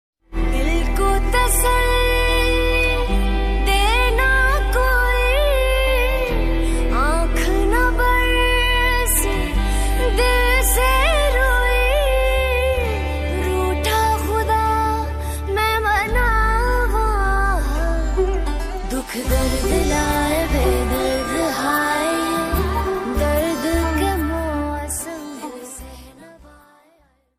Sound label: female version ringtone